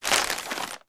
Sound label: paper RUSTLE; aronwk collection; ndaudio